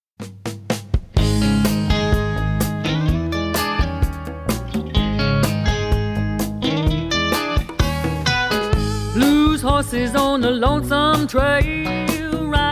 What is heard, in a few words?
Blues; Horses